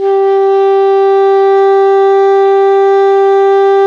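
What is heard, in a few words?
ALTO pianissimo G 3, Sax; Giga Samples Collection; ALTO SAX PP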